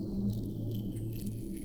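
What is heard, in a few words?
Music Dpad Evaporate 145; from dust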